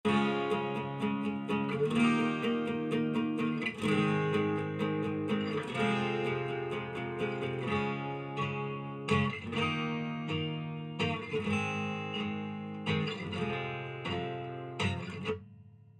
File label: acoustic acoustic